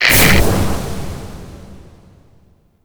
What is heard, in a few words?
Order Mode Toggle On; Gameplay; Kahl; Warframe; Veilbreaker; Devourer Kahl